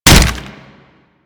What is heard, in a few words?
Arm Attack Hit; Warframe; Infested; Ancient; Attacks